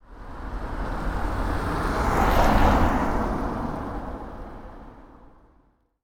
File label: car pass